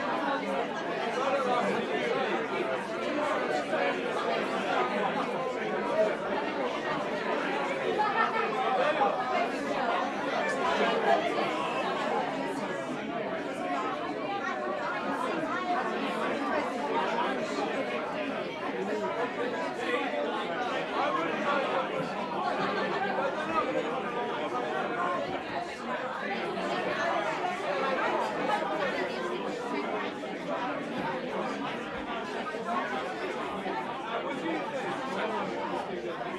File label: Club Chatter